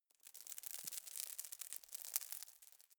cave oneshot dust

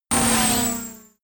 sci-fi laser shot

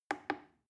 plastic button little click soft slow

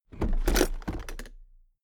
toolbox open heavy

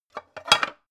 pan handle

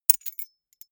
key ring small place

